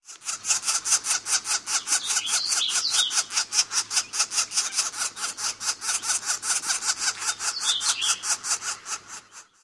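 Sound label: nestling calls red-shafted, bird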